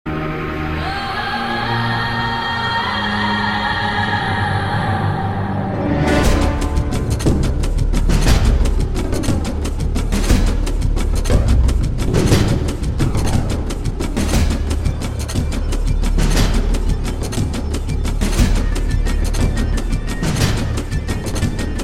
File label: pop tone